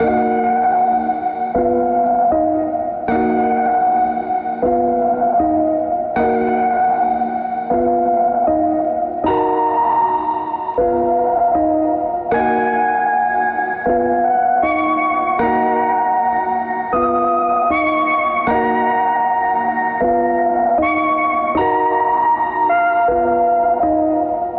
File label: leaking gas main, Electronic Loops